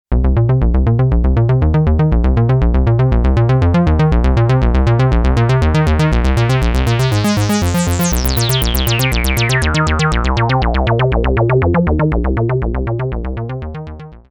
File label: Acid Bass